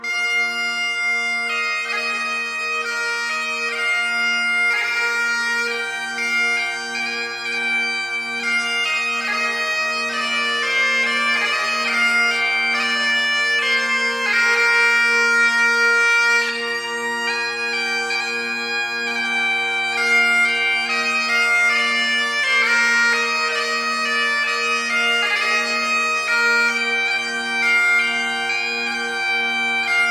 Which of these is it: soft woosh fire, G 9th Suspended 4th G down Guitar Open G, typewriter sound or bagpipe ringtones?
bagpipe ringtones